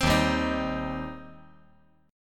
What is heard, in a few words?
Eb 6th Eb down